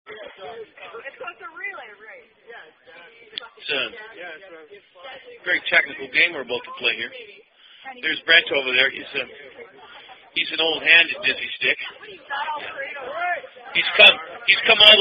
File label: Non-Drinking Game Relay Version; Dizzy Stick 2